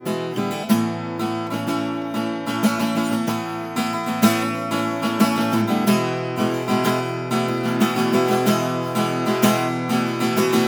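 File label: acoustic Guitar Cutting off; Earthworks SR20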